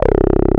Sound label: TB - 303